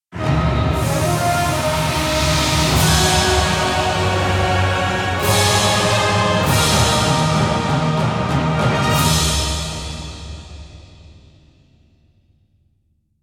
Logo

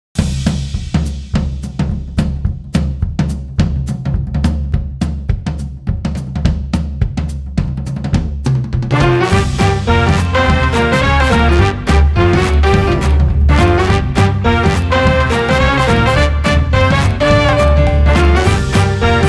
Thump

